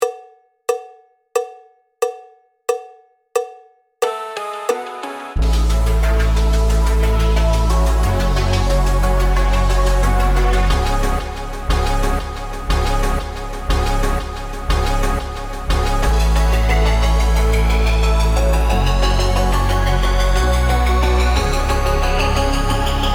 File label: Hip Hop Clap Song no Click; 105 bpm